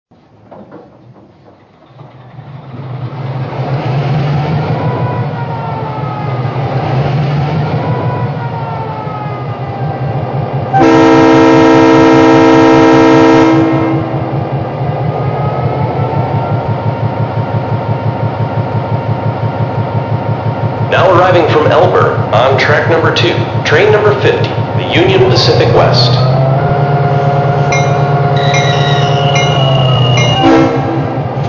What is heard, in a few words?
EMD E-8